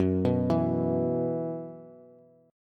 Gb 7th Suspended 4th Gb down Bass, chord; Gb7sus4; arpeggiated; JGuitar

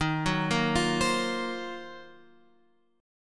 D sharp Minor 6th Add 9th D sharp, chord; D#m6add9 Chord {11 9 8 10 X 8} arpeggiated

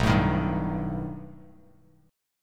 B Augmented 7th B down Bass Standard; B+7; strummed; JGuitar

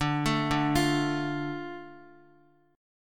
D Minor D Guitar; Dm arpeggiated; x,x,2,2,2,1; JGuitar

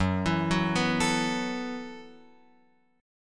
F sharp Minor 11th, Guitar, Open F; arpeggiated; JGuitar

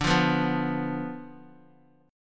D Minor 6th Guitar; Dm6 strummed; JGuitar

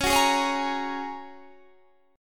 G sharp 9th Suspended 4th C sharp

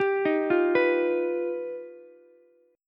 G Augmented 7th, Ukulele; G+7 arpeggiated; JGuitar

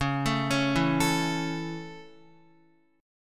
Db Minor Sharp 5th Db down Guitar; arpeggiated; Dbm#5; JGuitar

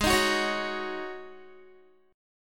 Ab 7th Suspended 4th Ab down Guitar; Ab7sus4 strummed

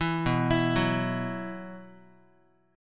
B Suspended 4th, Guitar

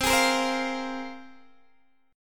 C 7th Suspended 4th Sharp 5th, Guitar, Open D

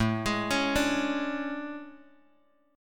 A Major 11th, guitar; AM11 Chord {5 X 6 6 3 X} arpeggiated

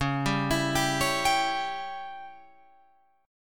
Db Diminished Db down Guitar Open F; arpeggiated; JGuitar

